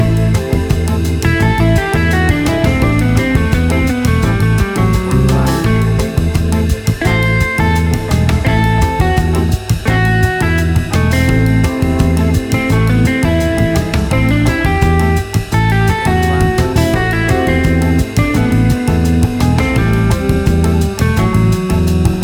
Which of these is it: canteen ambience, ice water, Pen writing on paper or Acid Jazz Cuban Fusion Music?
Acid Jazz Cuban Fusion Music